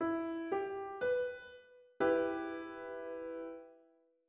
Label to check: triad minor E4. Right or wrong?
Right